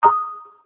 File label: Discreet; Sony Ericsson Z800i